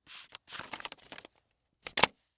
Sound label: Folder Gather Papers Set Down; Office; Blastwave